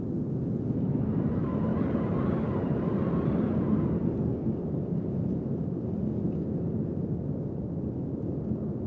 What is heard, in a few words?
room; Mafie 1